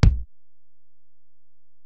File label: Ac BD, Alesis DM-PRO; 300 Drum Machines